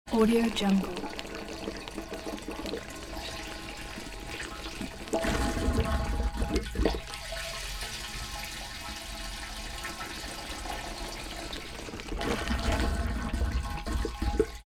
filling up tractor with oil from plastic jerry can; دانلود افکت صوتی پر کردن مخزن روغن موتو; industrial sounds